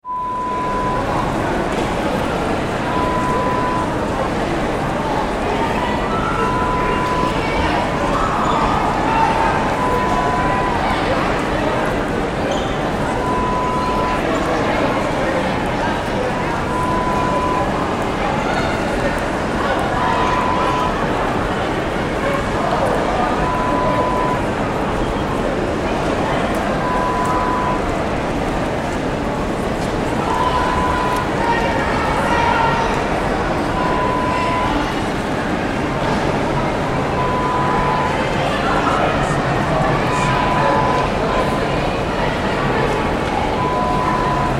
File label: Station ambience sound